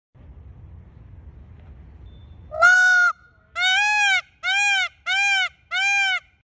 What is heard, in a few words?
Tavuskusu Afropavo Birds Peacock Sound; emprime tavus kusu